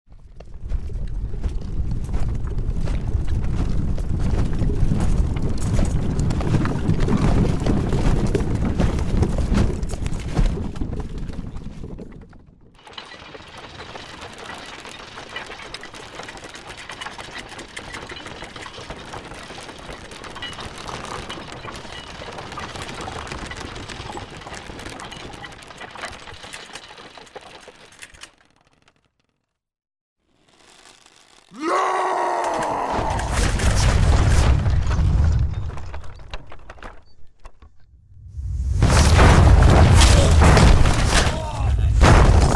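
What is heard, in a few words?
beginning of a battle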